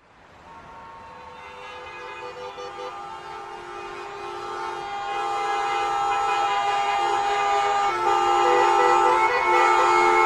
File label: traffic sample